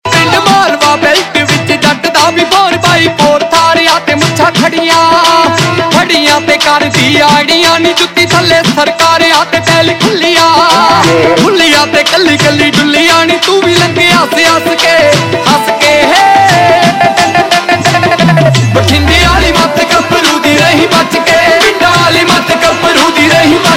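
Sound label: Ringtone, Punjabi Pop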